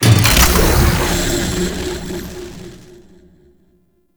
Shrine Assemble Activation; Warframe; Duviri Murmur

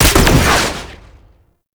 grenade launcher shot; Weapons; Scaldra

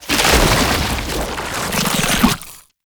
Tank Infested Charge Rise; Enemies; Abilities; Warframe; Lasrian Tank

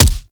Weapon Punch Shotgun; Warframe; Weapons; Shotguns

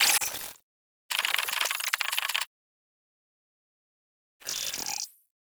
Reactor Death Intro; Warframe; NineteenNinetyNine; CharacterIntro

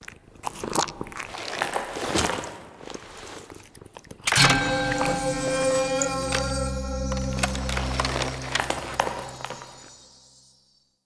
Stinger; gameplay; Warframe; Entrati Labs

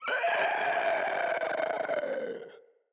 Infested Runner Death

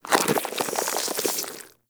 Infested Flesh Door Close; Ambience; Props; Warframe; Infested Eris

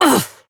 Pain, Adult Operator; New War

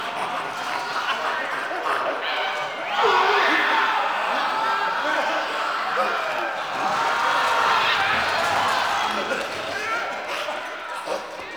Destroyed Class Room Chaos Loop; Warframe; The New War; Zariman

